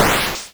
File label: explosion short soft